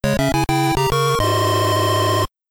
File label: START (PC-8001); New City Hero